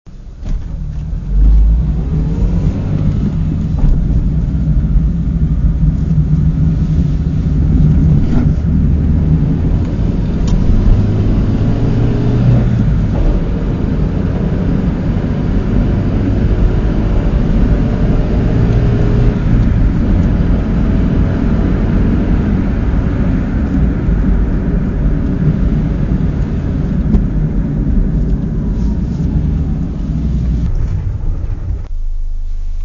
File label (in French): auto intérieur route